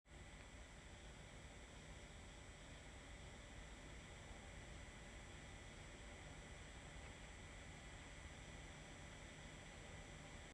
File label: fan; REDMAGIC 8 Pro